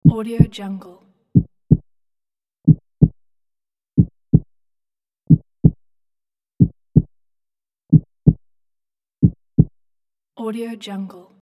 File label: heartbeat